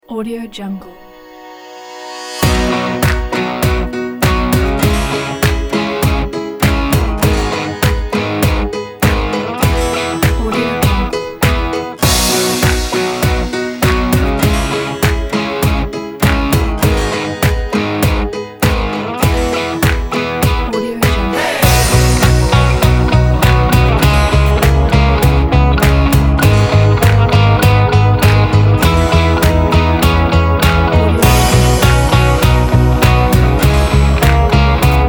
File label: uplifting rock kit